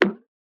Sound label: plastic hammer, plastic hammer hit